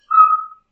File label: bip